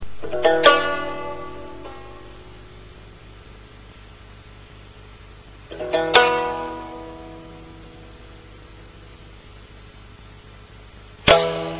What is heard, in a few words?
biwa